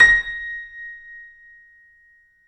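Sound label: Bb5, YC7 piano; Roland L-CD701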